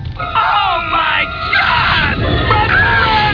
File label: pain